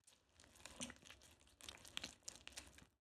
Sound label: squishy medium; soundscrate